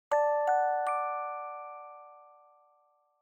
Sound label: Smartphone UI Sound Dry